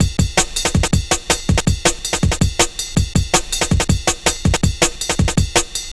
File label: Ride Break, Drum Loops, Jungle, 162; Jungle Warfare 1&2